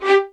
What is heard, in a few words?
LDVLN1 ATT G, LOUD VIOLINS; Q Up Arts; Denny Jaeger Master Violin Library